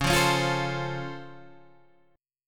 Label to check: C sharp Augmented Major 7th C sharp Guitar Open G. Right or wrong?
Right